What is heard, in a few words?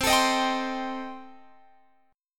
C Minor 6th Guitar Open F, chord; Cm6 strummed